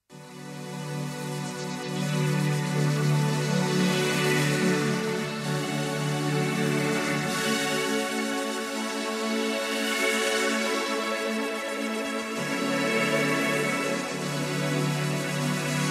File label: Korg Pa900 modern beat; Sergey K